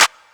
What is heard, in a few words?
Loud Clap; Claps